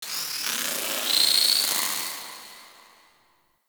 Lair Exterior Creature; Ambience; Misc Creatures; Sentient; Warframe

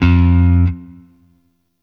Shawzin Oct Two F; Warframe; Sentient Shawzin

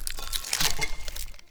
Foley Get Up Front; Enemy; Characters; Corrupted Sproutfolk

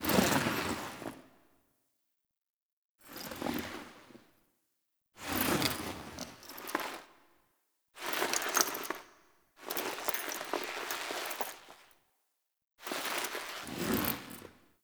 Intro Foley; Cinematics; Warframe; TheNewWar; Zariman

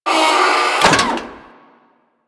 Sabotage Fuel Injector Open; Warframe; Missions

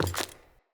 wood step; Characters; Ambient; Sprout Farmers

